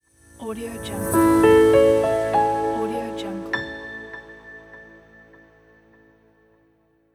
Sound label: elegant logo; anatolymusic